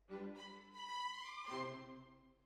Hall

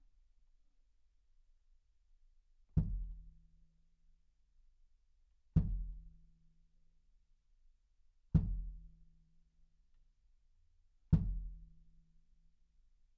Kit

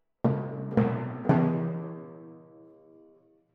Twin F Timp

